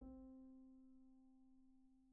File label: Single Note; piano